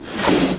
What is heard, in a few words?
whoosh